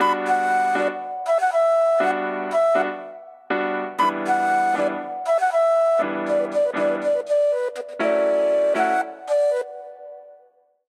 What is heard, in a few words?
Funky Flute; Samsung Z2; Ringtones